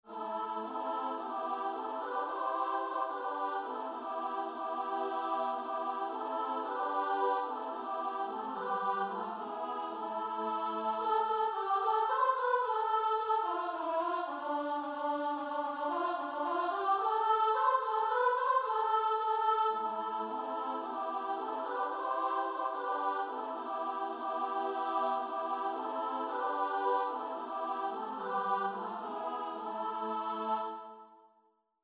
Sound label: choir